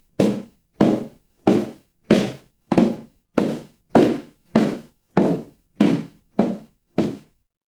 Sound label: footsteps stairs